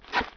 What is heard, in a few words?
UNDRAW SOUND